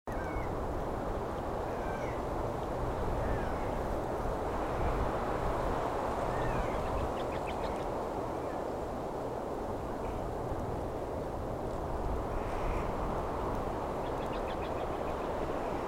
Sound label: mountains, ambiance